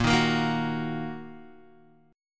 Bb Suspended 4th Sharp 5th, Bb, down, Guitar, Open D